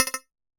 Plucky Ducky, Synth Keys; Ableton; Factory Packs; Chop and Swing

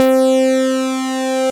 Sticky Clavinette, Synth Keys; Ableton; Factory Packs; Beat Tools

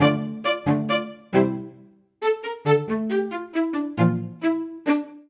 Phrase D Minor 136 bpm (3/4), string quartet; MIDI Clips; Court Affairs; Ableton; Spitfire Audio

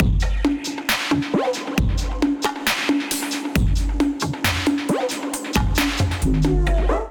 Kit Melodic 135 bpm, Drums; MIDI Clips; Bark Park Kit; Ableton; Factory Packs; Skitter and Step

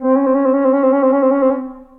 Alto Flute Solo Half Step Trills; Ableton; Orchestral Woodwinds

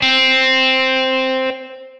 Guitar, Amped; Guitar and Bass; Pixelated; Ableton; Factory Packs

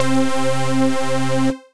Brass Buzz Ensemble, Operator, Brass; Instruments; Ableton; Factory Packs; Synth Essentials

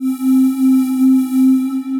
Pad, Synth Pad; Soleil; Ableton; Glitch and Wash

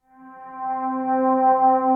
Phasing String; Instruments; Distressed Strings; Ableton; Mood Reel

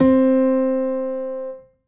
Grand Piano Equal Mellow Solo; Ableton; Factory Packs

